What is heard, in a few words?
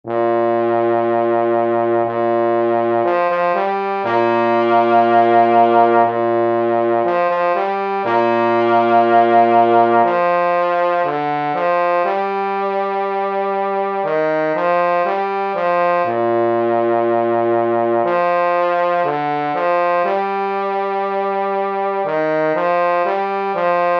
trombone